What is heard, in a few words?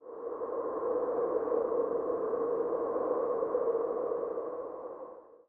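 dirty wind blow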